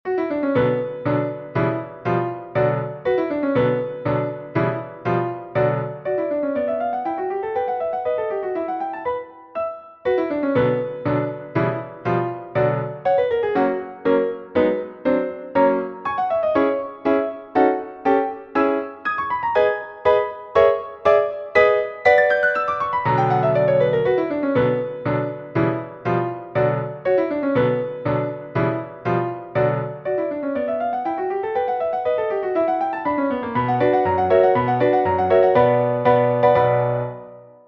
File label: Study in B Minor